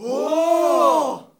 ohh, small cheer; 1000fx

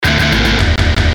Lip Ticker; Best Of N2O Library

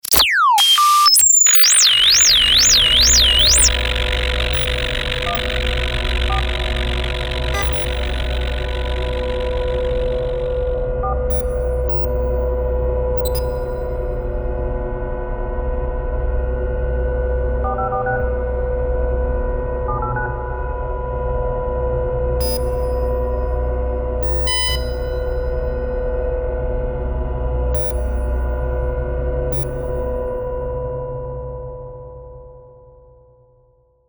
Mower Drone; Best Of N2O Library; Drones & Foundations

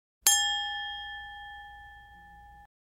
Glass Ding Crystal Champagne Flute Toast; Household

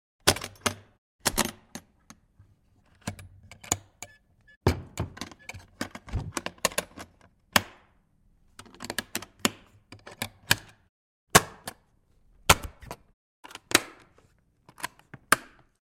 Briefcase Opens & Closes w Latch Noise; Household

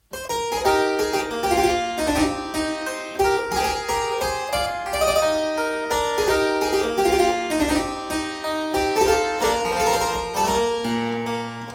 Branle de Basque, Harpsichord Music; Colin Booth; Louis Couperin

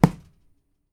PUNCH, FIGHT; 1000fx

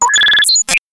tones

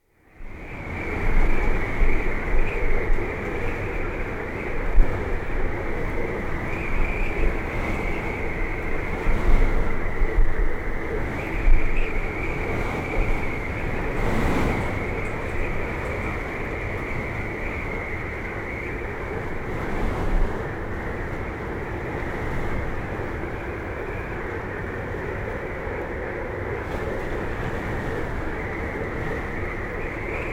Winter Blizzard; Dr. Sound Effects; 210 Nature Sounds - 20 Hours of Relaxing Natural Ambiences for Meditation and Sleep

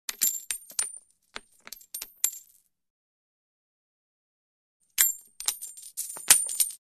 Coins Fall To Floor; Hollywood Edge Foley Sound Library

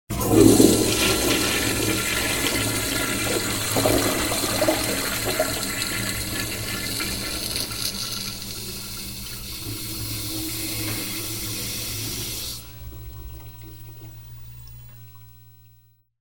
toilet flushing; SOUND EFFECTS1

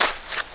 SAND, FOOTSTEPS; 1000fx

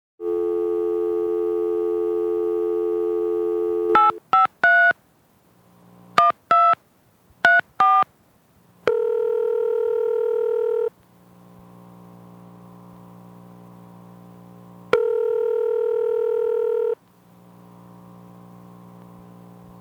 Telephone sequence (touchtone phone); SOUND EFFECTS1

